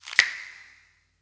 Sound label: snap; eddyflux; dough-samples